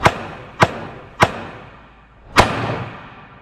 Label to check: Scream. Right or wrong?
Wrong